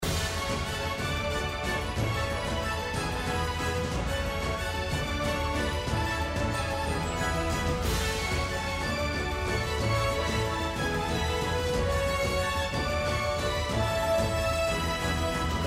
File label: Free Spin BGM; slots; Pirate Quest